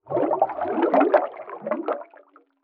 Underwater Water Splash Gurgle Bubbles

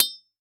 Impact Sword Impact On Cinder Block

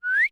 Human Female Whistle

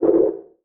Multimedia Internet CD-Rom Flash Whoosh

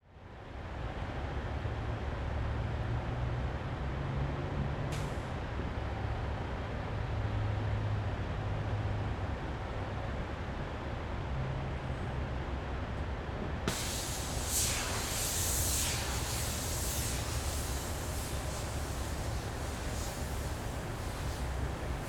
Ambience Traffic Above On Bridge 180; AdobeCC

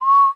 Industry Whistle Air Blowing Through Tube

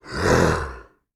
Animal Mammal Carnivore Cat Tiger Roar; animals; AdobeCC

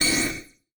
Multimedia Internet CD-Rom Flash Blast

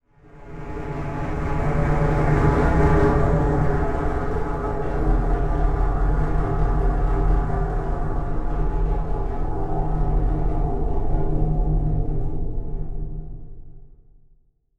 Transition Stab Dark Metallic; Production Element

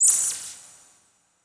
Title Transition Laser Short

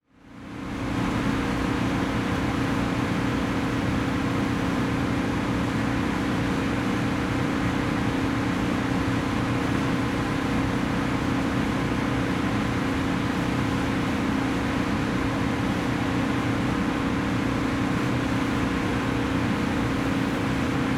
Industry Factory Generator

